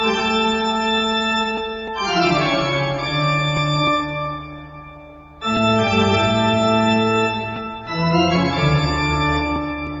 toccata and fugue in d minor

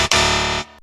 wrong answer gameshow

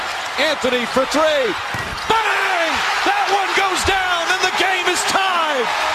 bang basketball

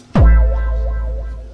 vacuum

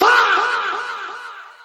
fahhhh

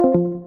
disconnect discord

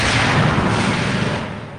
spellcard warning sfx; touhou

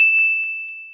ding check

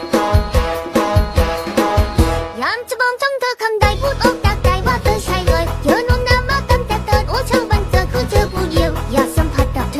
mambo

